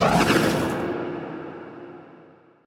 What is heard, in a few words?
power, beacon; Minecraft; Votive World